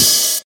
Open Hat Sample D# Key, Royality free open high-hat sound tuned to the D# note